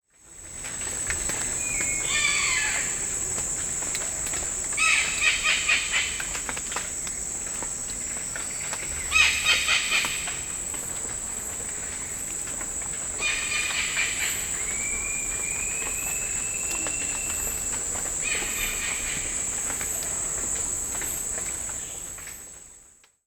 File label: Black caracara